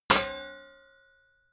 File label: sheet ring; hits impacts